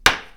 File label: go loud; browser go proto